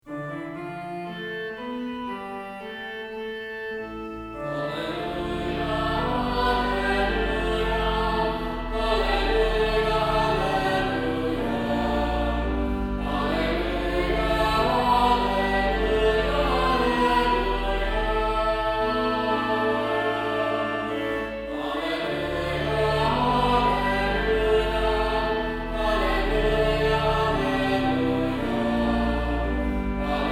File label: Gospel Acclamation